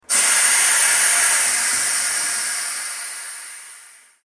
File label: air release sound